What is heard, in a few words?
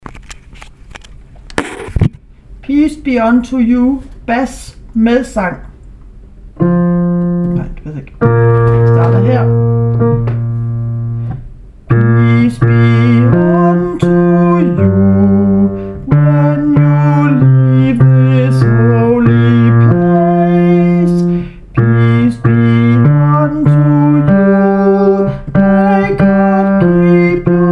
bas; peace be onto you